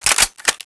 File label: ak47 clip in; weapons